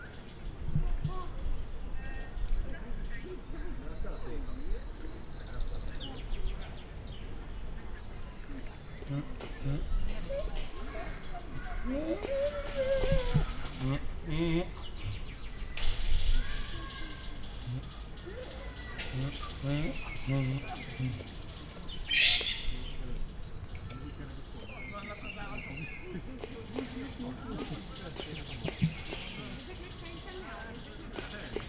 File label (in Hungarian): szamár, emu, aranyfácán, páva; felsolajos zoo 2015; cardioid